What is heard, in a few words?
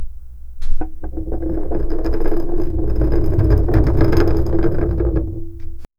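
a circle cd rolling vertically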